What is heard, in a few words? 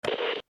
button